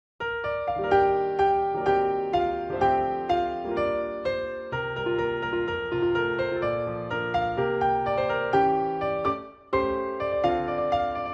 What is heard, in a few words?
piano ver.